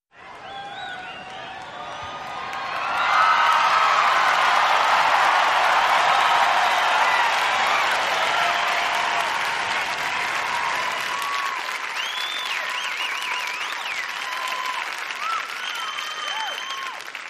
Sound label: Football Crowd Huge; sports arena football